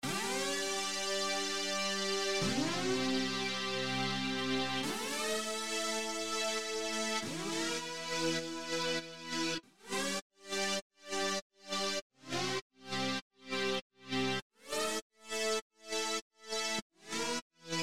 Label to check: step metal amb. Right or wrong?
Wrong